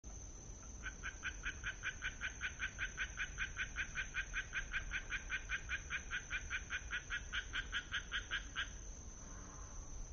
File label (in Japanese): kaeru amagaeru